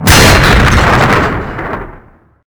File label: metal, aircraft effects; Vehicles